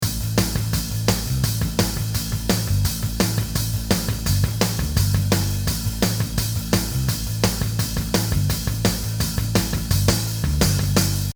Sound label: drum programming groove fill